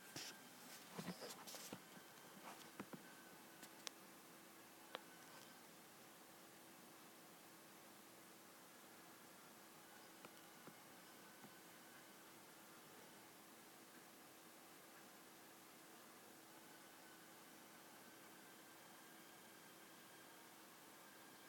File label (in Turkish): kis gecesinde pencereden sizan ruzgar sesi